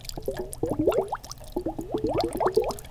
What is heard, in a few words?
liquidy